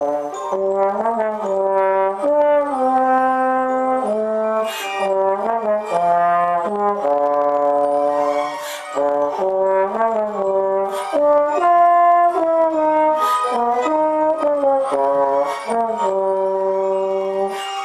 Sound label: Trombone